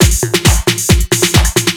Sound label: DRM, House, LOOPS 135; Best Service ProSamples vol.10 - House; AKAI